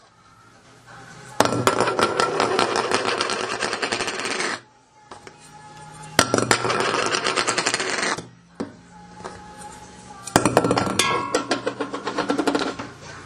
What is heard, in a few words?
Lens Cap Spinning